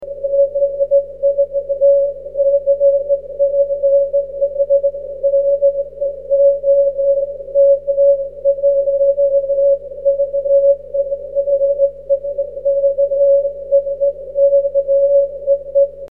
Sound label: cw